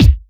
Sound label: natural kick one shot b key